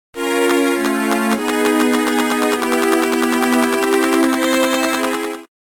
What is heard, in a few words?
Escape theme; Bolt from Boo